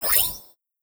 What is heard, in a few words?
Result Score